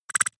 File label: noise flick splutter; maschinen; signale; soundpool